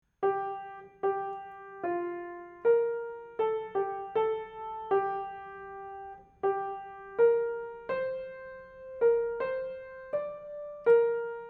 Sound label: Hymn Melody; Savior of the Nations Come